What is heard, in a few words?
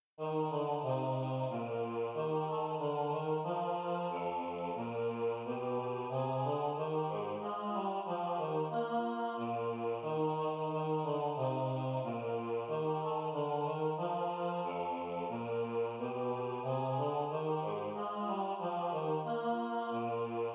bass over soprano; hymnal 1982; 91 break forth o beauteous heavenly light